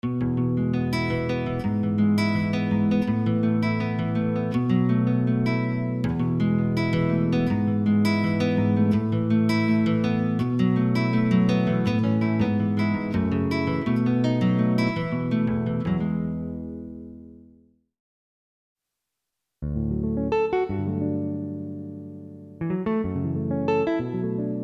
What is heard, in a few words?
Roland JV; roland-cartessons